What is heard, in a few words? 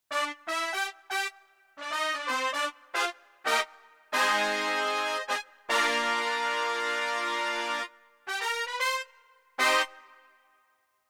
Roland RD 800 Brass